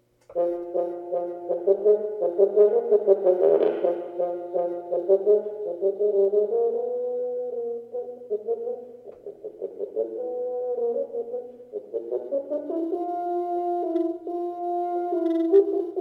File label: tuba